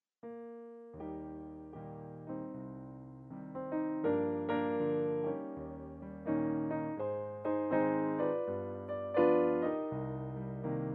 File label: Piano Vocalist